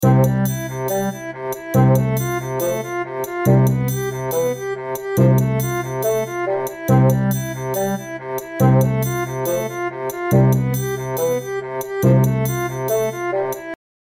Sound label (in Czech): viola